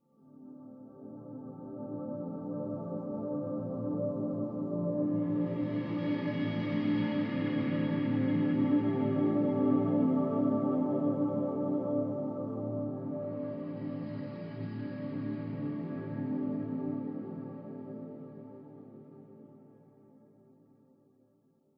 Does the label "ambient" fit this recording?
Yes